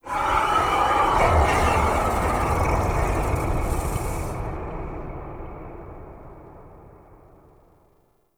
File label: Sky Breaths, Ambience; Warframe; Duviri